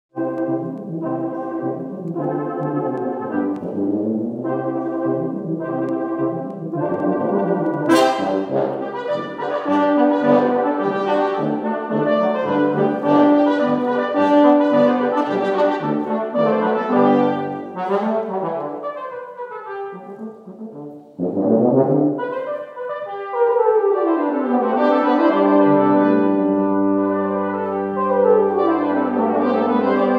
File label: brass